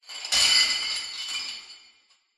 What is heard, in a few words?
horror chain shackles drop dungeon prison reverberant